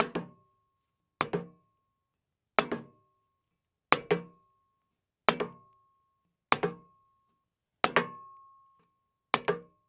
Foley Footstep High Heels Slow Walking On Metal Platform; Foley Footsteps